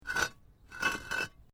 Brick Medium Hollow Move

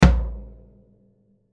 Tom Drum Low, Tom Drum Low Hit Hard; Drum Kit; Blastwave

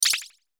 Hit High Sweep, Hit High Sweeper Fast Cartoon; Blastwave

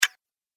Sharp Click Single Press

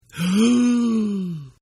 Gasp; Gasping; Sound Effects:Voice; Partners In Rhyme

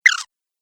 Cartoon Rodent; Cartoon Sound Design Sound Effects

